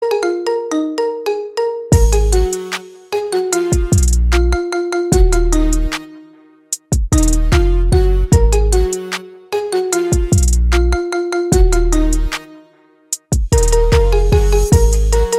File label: Marimba Remix